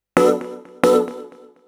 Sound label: 140bpm, keyboard; hous-tec